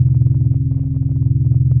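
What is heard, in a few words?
Ambience Sci-fi Hum Loop; SFX Kit; Sci-Fi Loops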